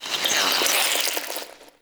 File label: Infested Plant Murmur; Ambience; Props; Warframe; Infested Eris